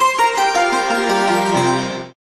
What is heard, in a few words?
free spin init; Amatic; Eye of Ra